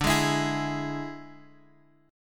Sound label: D Suspended 2nd Flat 5th D Guitar Drop D